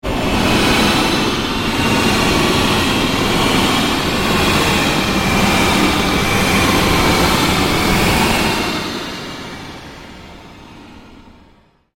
Created Fluctuation Fade, Rise And Dive Sound Effects; Sound effects created by manipulating other sounds; Rises and Dives